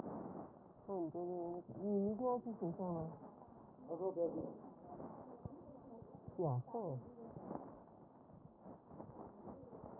CHEST

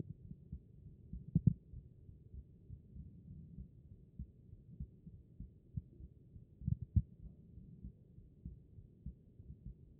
HEART B MODE; cardiac

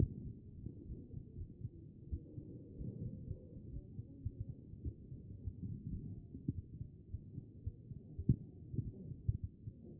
A CHEST; B MODE

